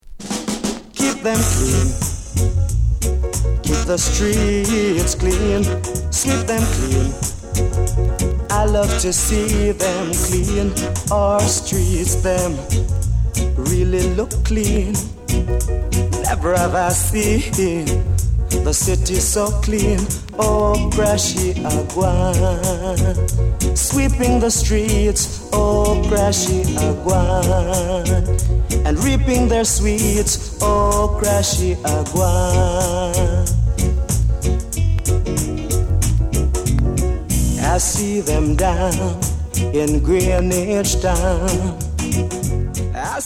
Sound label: Crashie Sweeps; Clinch